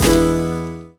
stop scatter on reels; Wild Guns

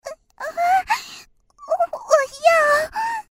moan; Imperial Girls

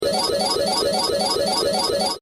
UI Roulette; One Shot Fishing

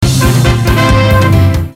free spin init; Billyonaire

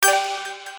collect end; games; Beauty Warrior

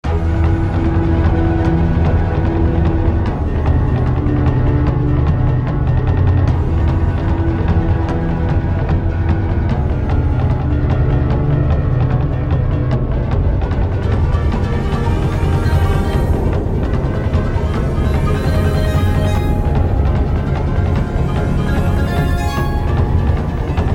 free Spin background; Queen Of Nile

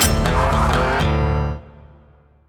symbol horseshoe; Wild Guns

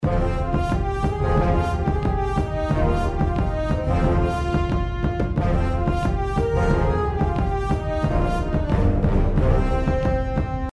outro bgm; Ares God Of War

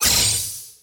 collect; Hungry Shark; Wazdan

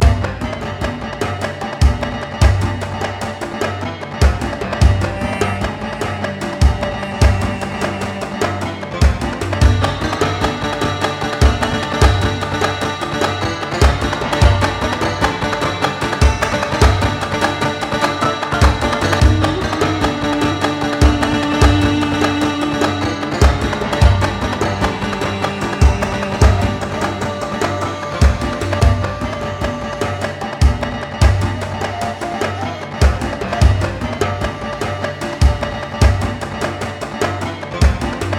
ambient free games; Golden Sphinx; Wazdan

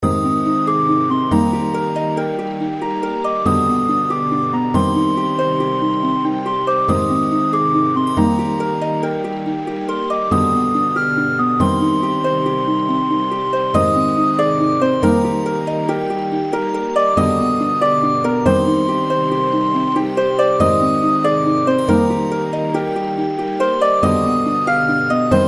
intro bgm; Stonehenge

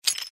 reelspin click; Lost Realm

